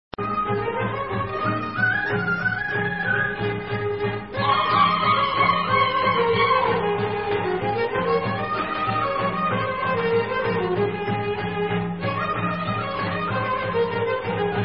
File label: Dobrudzanska Reka Bulgarian